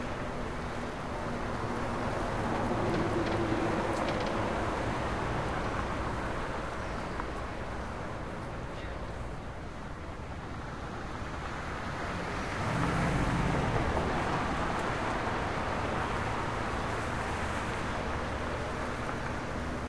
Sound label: Ambiance - City; collapse macos windows gamerip 2009